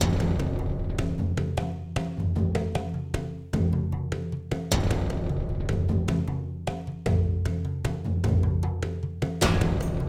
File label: Music Undermining Percussion; 7 Mages